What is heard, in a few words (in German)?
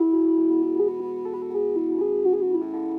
Luft